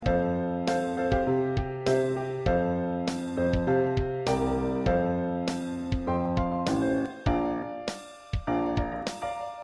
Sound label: Accompaniment